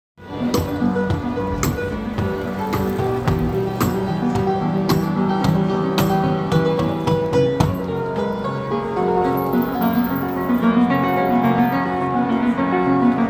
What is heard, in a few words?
piano player outside a church in munchen; Field Recordings; octoberfest